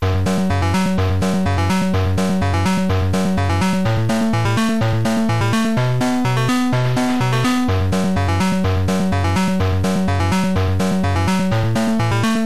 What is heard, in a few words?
game, 8-bit concentration loop